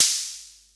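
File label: SR95 snare drum; Best Service; Dance Mega Drums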